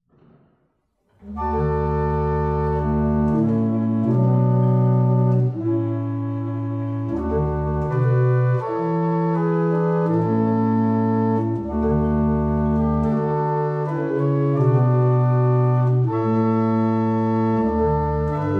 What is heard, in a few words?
Double chant, barrel organs; Staunton